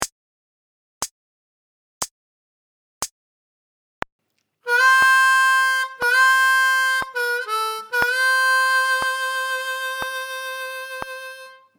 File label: Lick Slow, harmonica